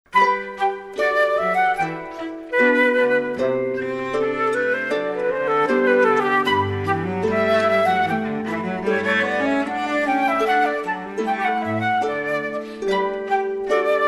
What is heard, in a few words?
Bach Gavotte, Trio